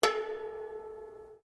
HAMMER DULCIMER STRIKE SINGLE MF, Old Chinese Hammer Dulcimer; Strings; Musical